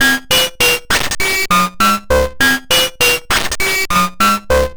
Modular Loop, 100BPM; musicradar; bitcrushed; Crushed Loops

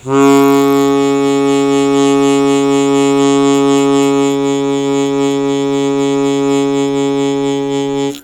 Long Sustain Fast Wah Wah C#2, Harmonica; Zero G - Harmonica

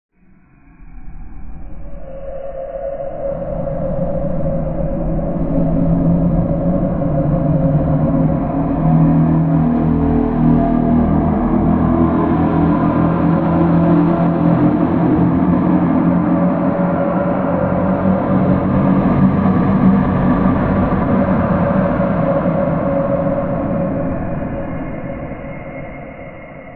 HAAAURGHH

